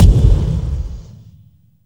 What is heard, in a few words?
GORILLE BD; USB Soundscan vol.33; Disjointed Textures; AKAI